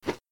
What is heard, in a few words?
woosh swing